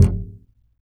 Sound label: Upright Acoustic Bass; 1ST POSITION; Larry Seyer